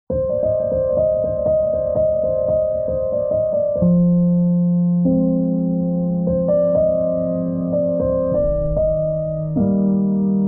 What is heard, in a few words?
Piano Peaceful, Lounge